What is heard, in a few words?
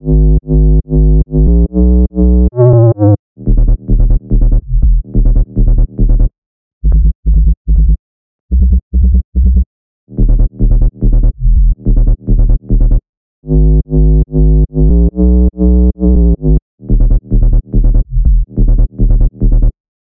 143 BPM D# Min Sub; Melody Loops; Loop Stems; Cymatics; Hyperbass Pt 2